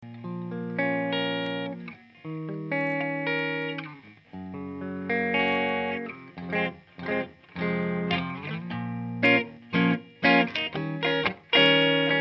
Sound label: clean telecaster; guitare; ampli 12AT7 + ECL82 SE